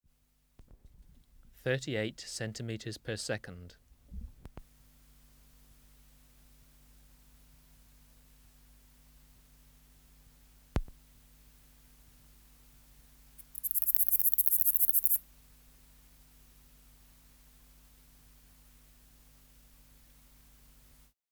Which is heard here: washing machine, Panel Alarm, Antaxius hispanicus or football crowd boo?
Antaxius hispanicus